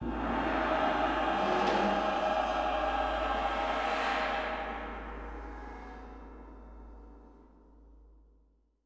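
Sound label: Music Door Flourish; bolt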